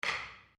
Wood On Wood

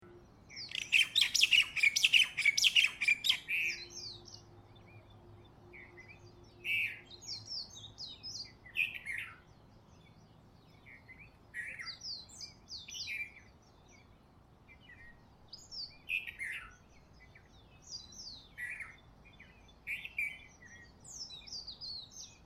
Honolulu Morning Bird Close Up Long; A very pretty and quaint birdsong sound. One bird in foreground, more in the back; Bird Sound Effects; Lynne Music

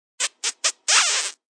Kiss Squeaky, Kissing, three squeaks then long; human voice; VoiceBox

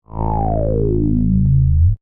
Scanner Beam Ray, Scifi; Heavy, Science, Fiction; Tech Sound Effects

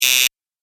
Tv Show Buzz In; electronic

